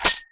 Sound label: cash In